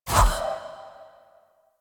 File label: Wisp Switch Generic; Warframe